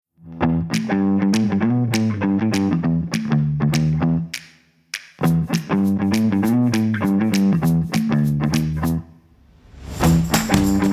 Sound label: Clapping Blues